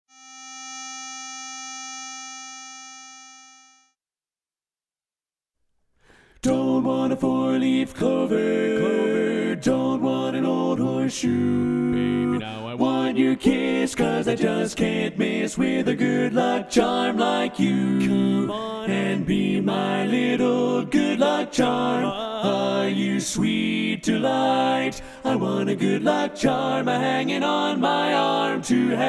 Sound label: baritone left